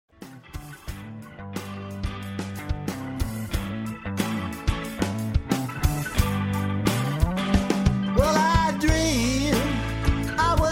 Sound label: Dr Bss Git Voc Org 90bpm